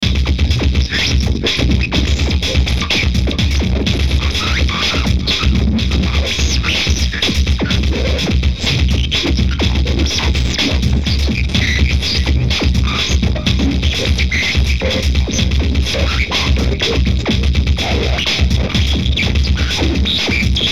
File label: BEAT HARDCORE, Beat Loop Hardcore Electronic Industrial Metal Distortion